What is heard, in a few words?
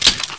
clip out, weapons